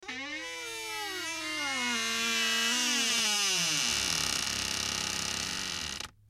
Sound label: door